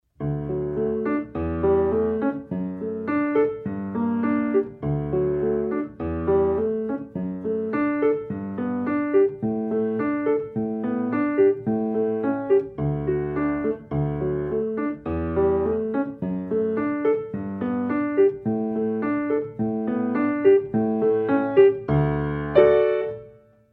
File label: violins kippers